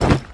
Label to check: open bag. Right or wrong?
Right